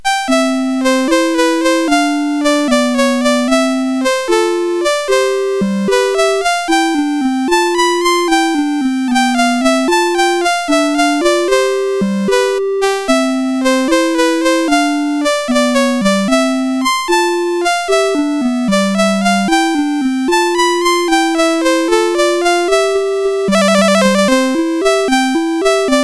disco, CSG8580; CSG8580R5

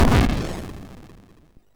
SFX, MOS6581; Narco Police; MOS6581R3

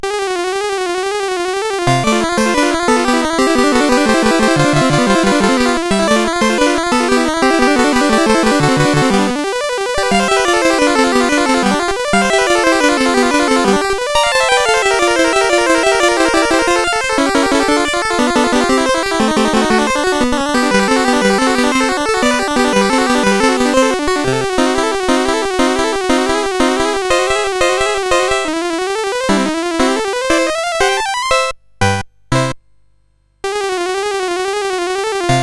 Spinning Song, CSG8580R5; sid

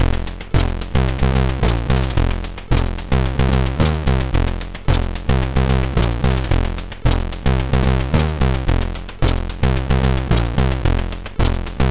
Funk, sid MOS6581R2

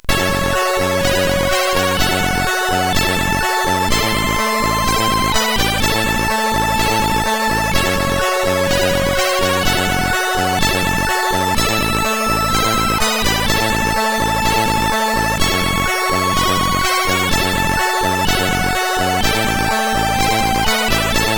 Snare CSG8580R5

